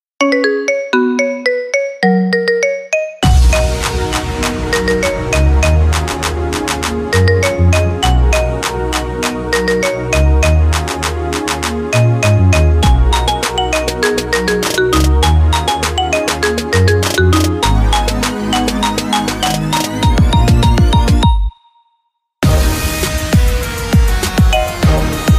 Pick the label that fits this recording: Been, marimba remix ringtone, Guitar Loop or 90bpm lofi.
marimba remix ringtone